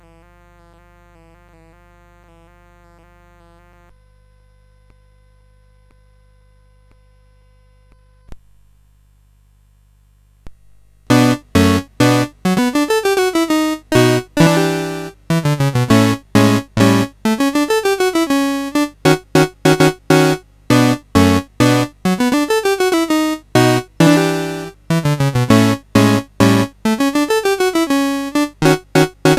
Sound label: Guitar Etude, CSG8580R5